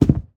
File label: Jump Carpet Land